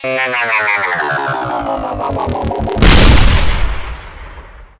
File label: guard; ships ratika